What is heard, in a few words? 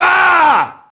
merc death; enemy; Max Payne